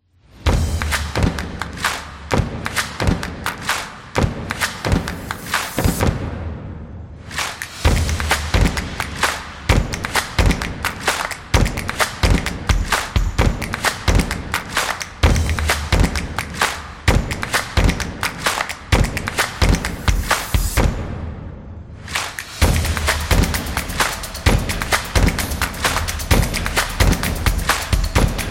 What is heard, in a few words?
Percussion Teaser; energetic percussion